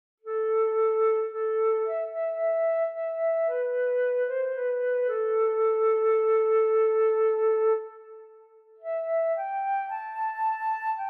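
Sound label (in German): solo Scarborough Fair Querflöte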